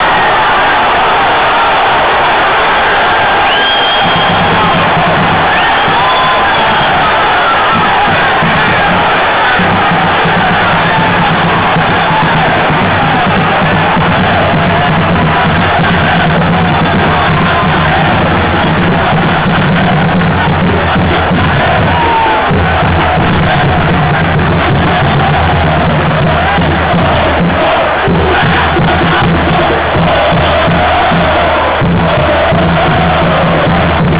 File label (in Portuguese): gritos; nautico